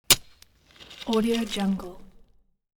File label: pick axe single